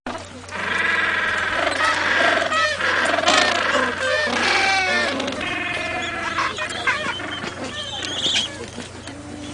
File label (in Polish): Odgłos Pingwinów; Odgłos pingwina; Odgłos pingwina Pawełka